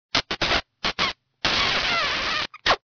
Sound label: Smoochy kiss